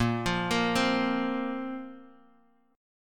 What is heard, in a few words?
Bb Suspended 2nd Bb down Guitar Half Step Down